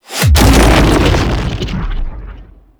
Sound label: brimstone cast impact sweetener; Uriel Brimstone; Warframe